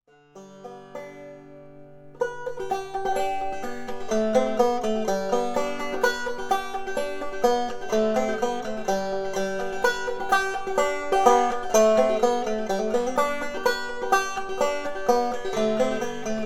Responsive. Overall bright, Good mid tones. Lots of sustain., banjo, Grenadilla tone ring; Soft maple rim; Recordings: M-Audio microphone and Fostex MR16 recording studio; Flop Eared Mule